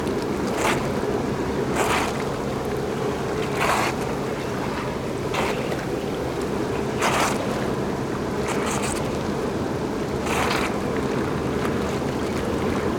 hot tub sfx ambience seamless loop all bubble jets on high water flow jets; the chris alan; Sonniss GDC